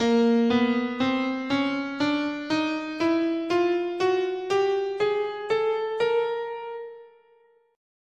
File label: A sharp Chromatic, scale